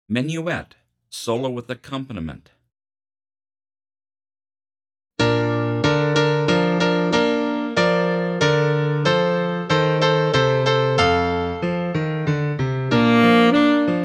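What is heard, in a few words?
Menuett Bari Full, Bari Sax; 20pct Slower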